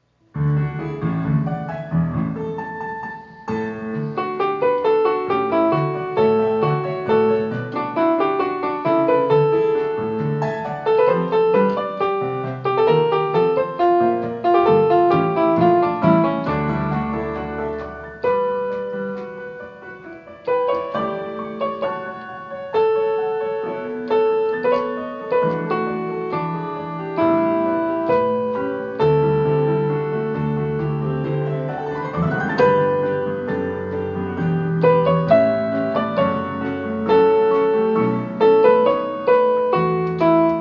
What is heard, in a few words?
WITH MELODY